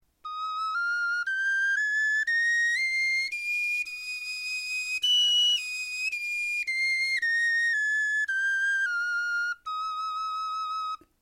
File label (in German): tonleiter, knochenfloeten